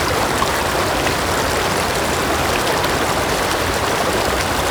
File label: Forest Waterfall Medium; Props; Warframe; Grineer Forest